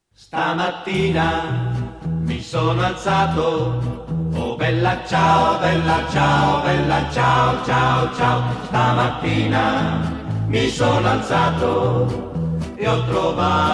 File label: Bella Ciao Italian Ringtone